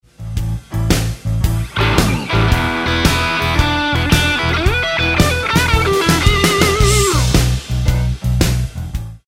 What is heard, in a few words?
Roadhouse Licks Lick, guitar; 20 Roadhouse Licks